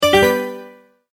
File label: multimedia tone, Goodbye; Sound Design; McKinney Sound